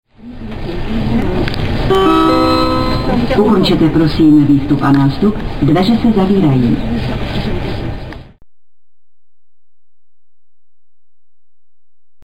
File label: subway